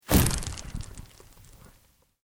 Sweep Organic Fast

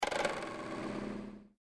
Clank metalic phasing; User interface feedback sounds; Clanks; Objects

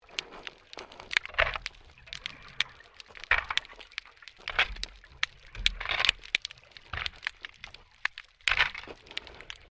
MAINTAINENCE WORK UNDERWATER SHOVEL SCRAPING; Maintainence divers off StKilda Pier; Construction

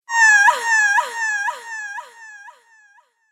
Female Scream Echoes; Screaming

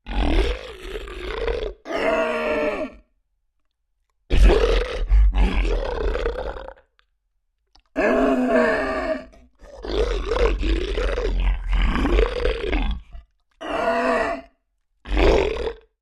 Creature Large Yelps Pain Distress; Monsters; Horror

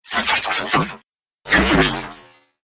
Dubstep Scifi Effects

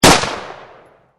M16 single, Assault Rifle; Assault Rifles; Guns; VRSonic

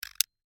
Handheld Counter

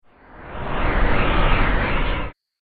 Sci Motion Flyby, whoosh sound effect; Andrew Fleming

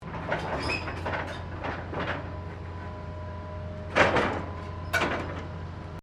Construction mechanical claw movement; Various construction machinery; SoundEffects Foundation2

